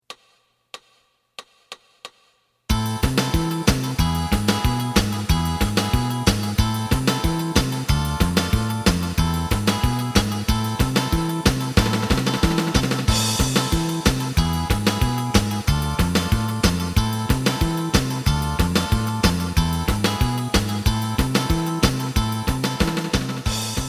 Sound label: lead